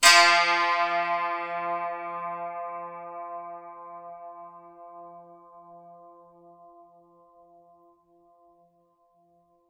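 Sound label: F3, dulcimer pluck; SoundBytes; Early Patches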